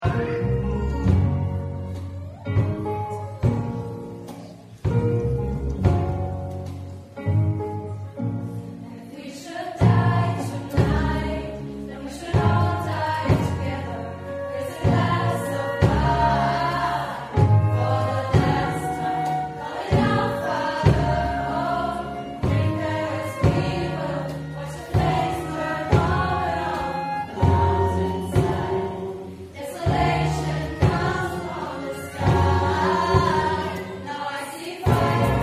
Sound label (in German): Chor Werbung